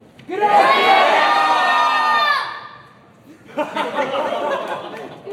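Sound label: exclamation people single call mass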